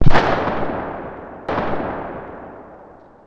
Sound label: kaboom